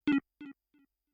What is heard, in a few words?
two Tone